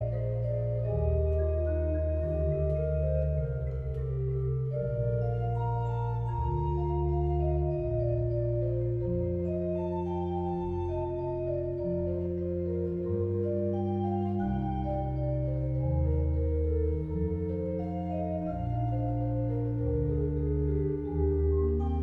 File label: Trio sonata no 2 in C minor ( largo, orgel )